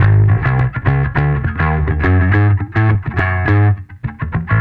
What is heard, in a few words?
Bass